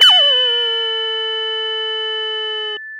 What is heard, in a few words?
Sound of a school bell